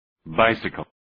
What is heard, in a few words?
bicycle